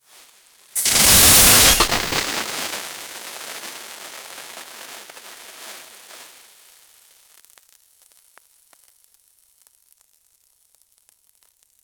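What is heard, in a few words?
confetti burst into the air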